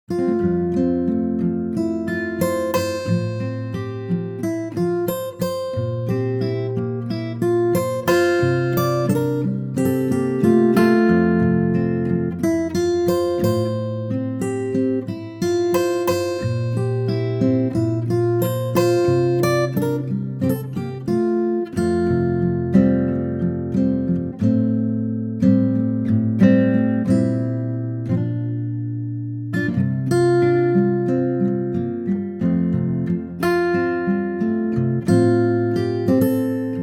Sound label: Ac Guitar F